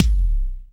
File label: BD, Bassdrums, Roland TD-20